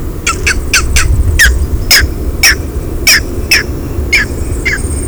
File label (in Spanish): martinete; Los Colores que Cantan